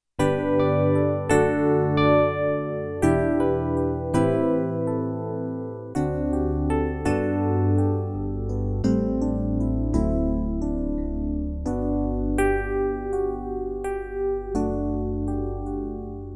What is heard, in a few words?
Roland FP-30X E-Piano